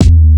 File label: KIK